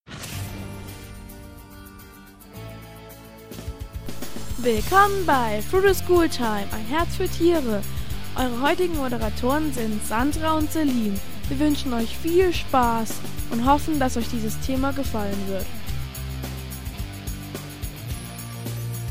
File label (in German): Radio AG Jingle